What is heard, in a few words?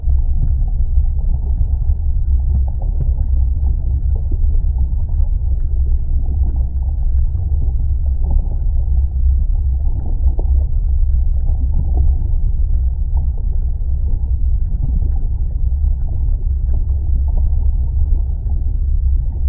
Ambience Volcano; Masters of Anima